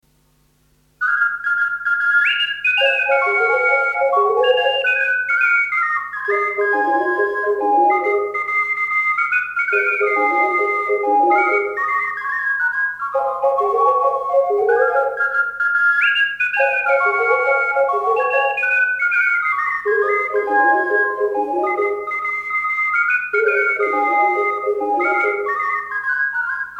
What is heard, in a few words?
veneziana, ocarina; balli